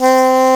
SAX D3, JAZZ

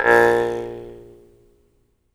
jaw harp, Pitched Percussn, Jaw Harps

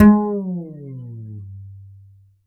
Upright Acoustic Bass, 1ST POSITION; Larry Seyer

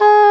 WND ENG HR, English Horn; Roland L-CDX-03 Disk 1

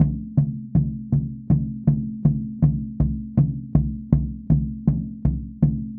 Pow Wow Drum East, Native American; Sonic Foundry (Sony Creative Software) - Continental Drift Aftershocks

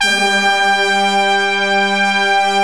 MONO BRASS; HigherChoir Orch

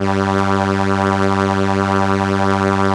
ARP SE G1; Sonic Reality; Vintage Timetraveler

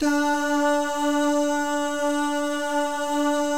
DAH, Vocal Jazz; ILIO

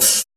HH, HIHAT HIP, Dance Mega Drums

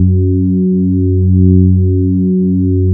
VAGUE F#2, BASSES; Best Service Alpha Dance vol.2

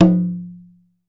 IYA ALT, BATA; Voice Crystal - Steve Reid Definitive Percussion

